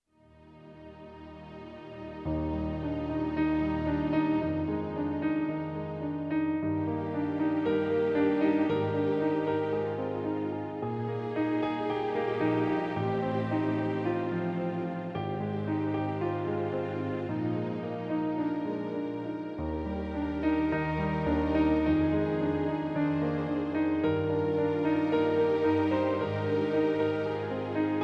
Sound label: yamaha p-255